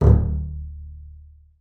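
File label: marcato D1 right, bass spiccato; Xsample VOL-5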